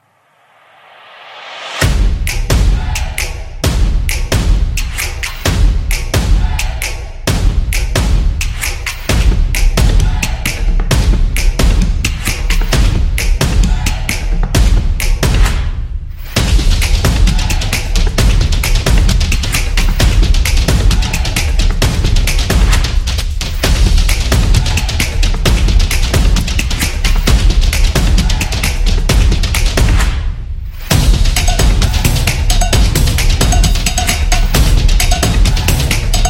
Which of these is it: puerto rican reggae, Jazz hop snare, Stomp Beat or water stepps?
Stomp Beat